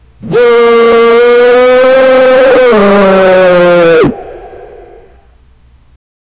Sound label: an alerted sound of some, infected